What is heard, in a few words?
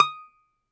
DOMRA D, Prima Domra, Plucked Strings